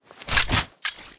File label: hack complete console; dataknife; Titanfall 2